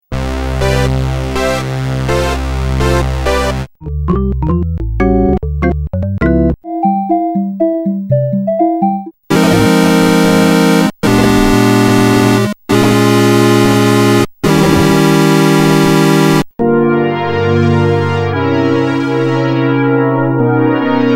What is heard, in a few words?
Poly Synth Sounds